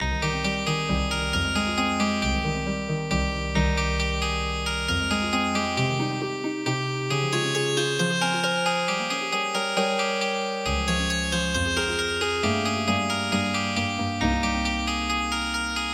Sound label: Pakistani Lullaby Healing Harp